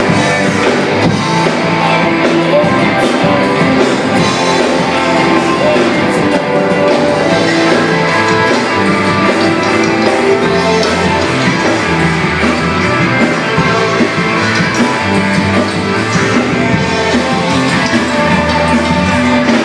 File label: cover band